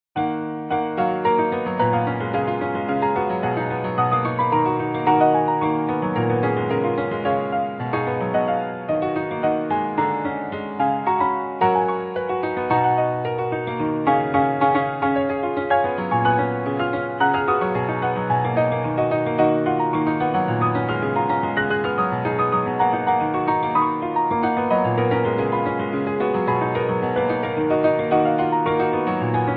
Acoustic Modern Upright Piano; RDGAudio

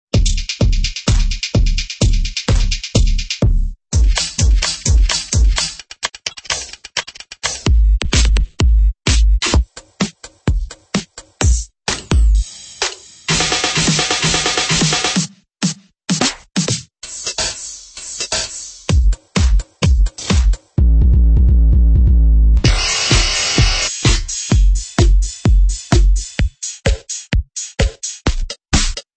EDM Drums

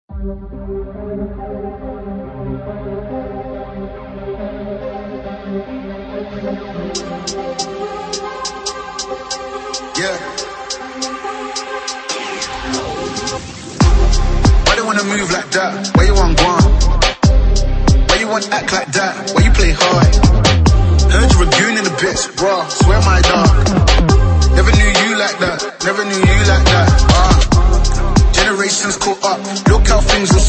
Modern Trap Vocals And Rap Acapellas

